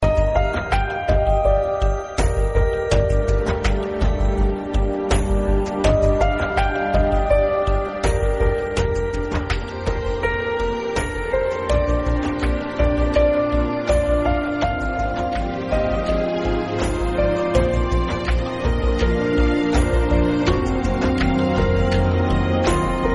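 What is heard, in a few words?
instrumental, ringtone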